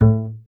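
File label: STR CBS, Solo Instruments, double bass; Miroslav Vitous; Solo Instruments VOL-2; Solo lnst. II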